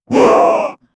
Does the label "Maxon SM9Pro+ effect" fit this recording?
No